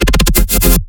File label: 155 Fm Chord